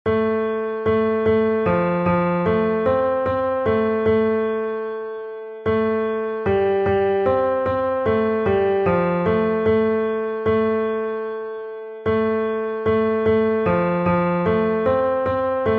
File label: tenor